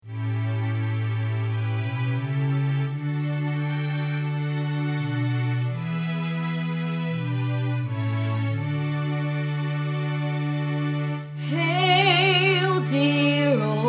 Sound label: Vocals; Shaw Alma Mater